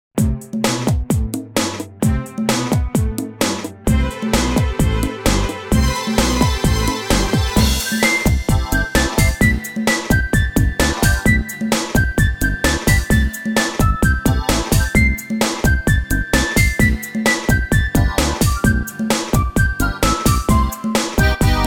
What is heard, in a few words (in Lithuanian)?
karaoke